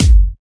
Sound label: Kick, Kicks; FLPRO